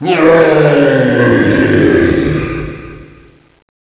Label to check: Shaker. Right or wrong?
Wrong